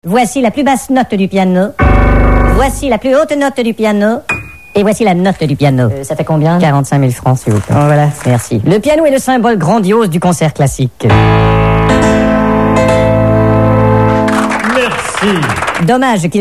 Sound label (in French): Piano